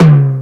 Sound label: Linn, LinnLinn Drums